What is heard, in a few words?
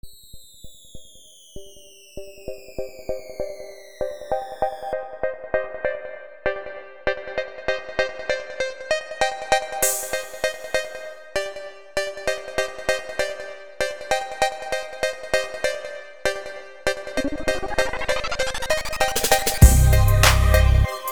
Beat